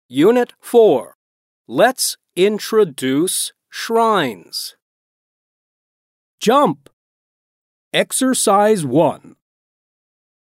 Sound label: Jump